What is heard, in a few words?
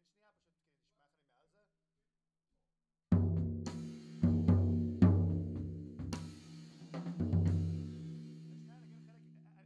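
Floor, tom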